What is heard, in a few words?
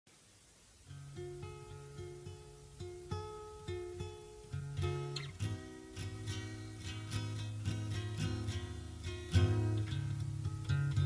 Guitar Pluck jam